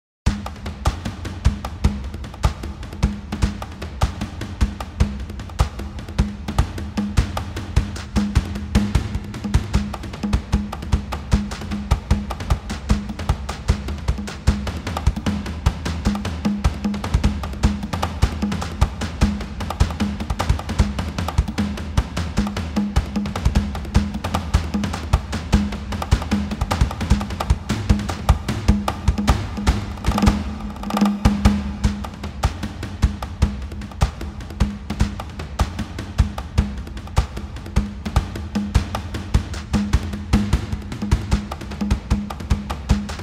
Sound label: Music; Dungeon Master Nexus; Sega Saturn